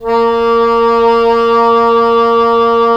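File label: wind cor anglais; LA Composer vol. 3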